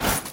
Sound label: Loot; SoftKitty; Inventory Engine